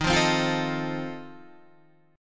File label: F 7th Eb